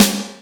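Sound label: snare drum, Drum & Bass; Ueberschall; Drum & Bass Resonance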